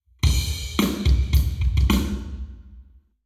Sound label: Hall, Drums 0deg; eBreve